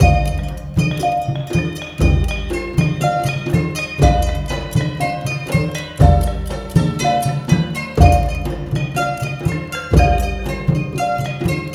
MALAY L, Heart of Asia

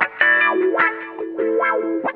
GUITAR

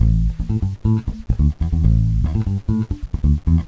Funk, Bass; Sonic Foundry (Sony Creative Software); Crimson Blue and Fabulous Horncraft 4 RnB; Loop Samples

